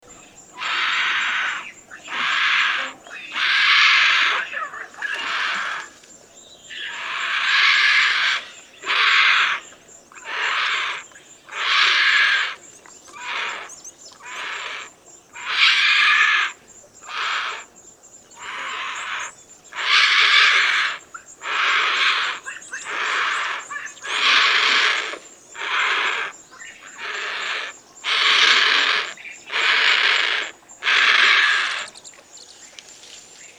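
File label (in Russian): гриф Рюппеля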